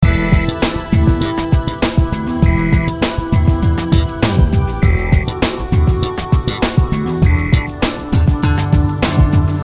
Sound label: loop